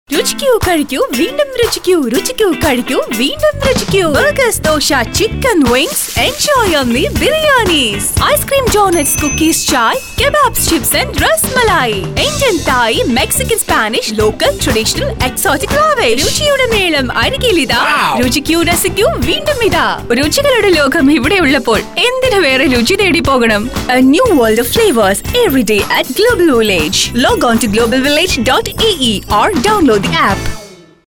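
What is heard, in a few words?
jingle malayalam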